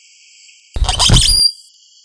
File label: whinny, ateles geoffryi; bosque seco